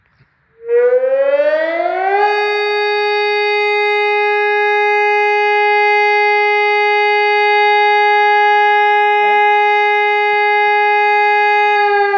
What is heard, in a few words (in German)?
Elektronische Sirene; Sirenenbau Fischer